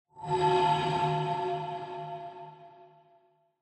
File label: Horror Accent High Short